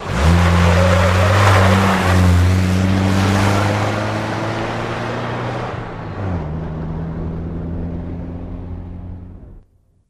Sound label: Jeep Chrke 84 Skid Out; jeep cherokee 84